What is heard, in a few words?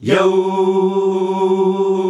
YOOOOH G, male choir; Best Service; Hyper Dance